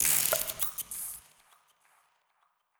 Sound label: bbx